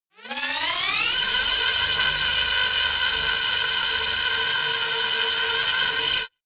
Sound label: phasing wobble swell; risers